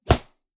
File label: Punching Percussion, Stick Hit, raw; 99Sounds